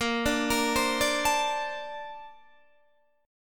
Bb Major 9th, Bb, down, Guitar, DADGAD

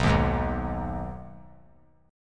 B 6th B down 3C 3F 3A 3D, chord; strummed

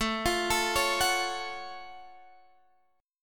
Gb Minor Major 7th A

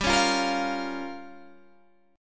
G Minor 7th Flat 5th, Guitar, Open G; chordshape; down

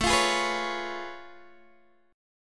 A Augmented Major 9th A down Guitar DADGAD

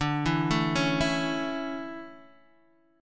D Major 7th Suspended 2nd, Guitar DADGAD

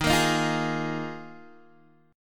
Eb Suspended 2nd Suspended 4th, Guitar, Open G

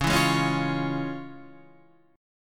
F Minor Major 7th Sharp 5th, Db

